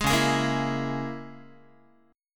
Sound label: Db Minor 6th Db down Guitar; Dbm6 strummed; 11,9,11,8,8,x; JGuitar